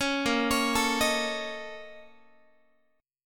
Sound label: A sharp Minor Major 7th Double Flat 5th, Guitar; arpeggiated; 16,18,16,19,19,x; 4A,4E,5A,5D,5G#,6C#; JGuitar